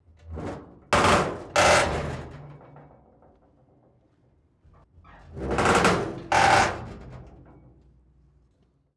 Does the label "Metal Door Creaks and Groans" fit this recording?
Yes